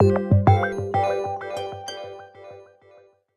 Jingle Finish Bronze; Super Rub 'a' Dub; PS3

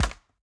Bounce Electronics

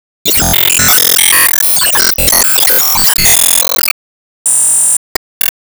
Ingame Playstart

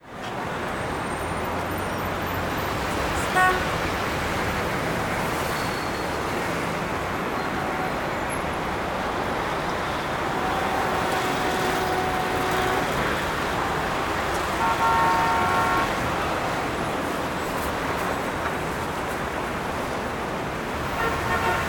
La Sagrada City; Google Earth VR

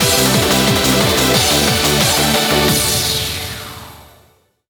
Bgm Reach

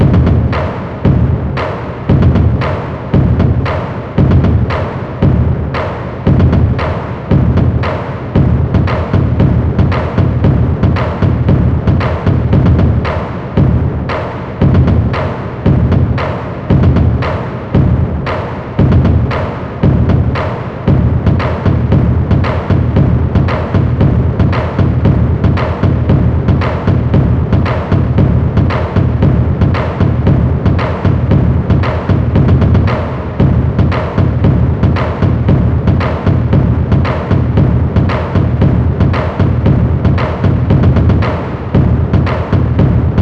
Music Test GROUP ADD DRUMS HIGH

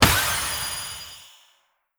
Change Bumps; disney star smash android ios mobile gamerip 2020

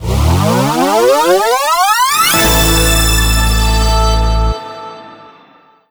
tune bonus win; Tippin Bloks; TUNE Bloxx BonusWin Rev

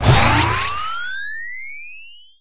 Hoverboard Activate; subway surfers city sound effects; Android, iOS

